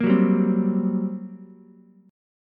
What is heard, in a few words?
G Minor Major 7th Double Flat 5th, G, Ukulele; strummed; JGuitar